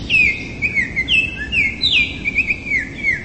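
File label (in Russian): чарующий свист маленькой птички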